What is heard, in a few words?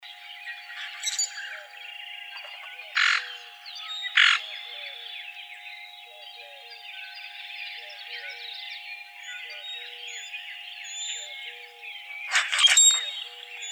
Common Grackle